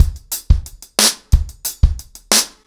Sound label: 90BPM, acoustic drums, drumloops